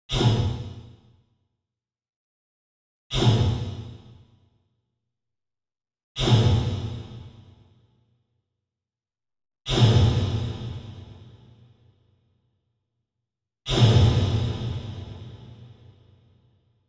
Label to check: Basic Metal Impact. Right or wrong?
Right